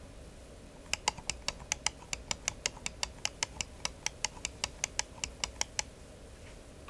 Maustasten

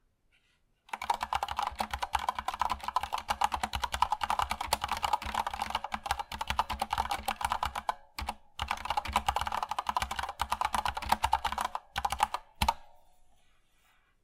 K70 Sound Tippen

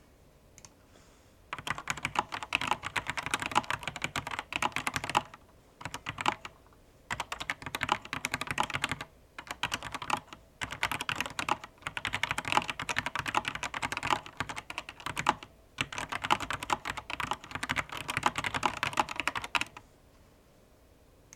Tastatur Schreibgeraeusche

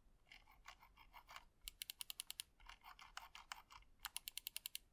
Scrollrad drehen und druecken

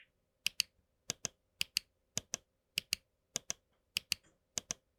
Sound Maustasten

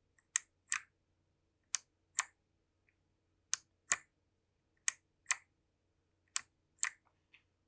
Esc Langsam

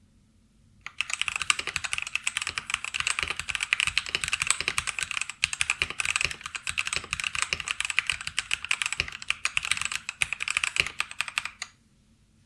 ABS Tippen; SGK50 S3

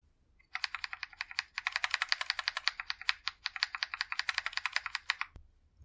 mehrmals ESC; GMMK2

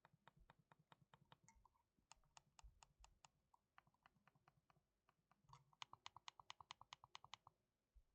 Daumentasten

